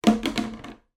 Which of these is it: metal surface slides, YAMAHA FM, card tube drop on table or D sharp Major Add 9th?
card tube drop on table